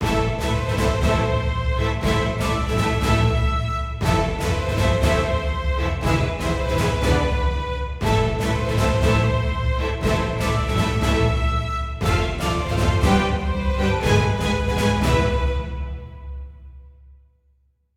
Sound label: heroic forte